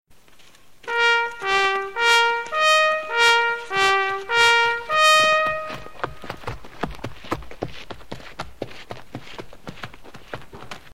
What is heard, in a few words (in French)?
Sonnerie Au Pas